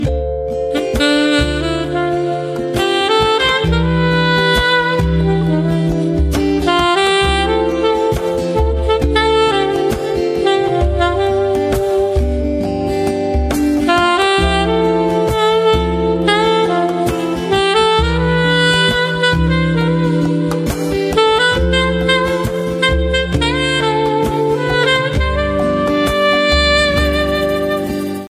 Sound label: saxophone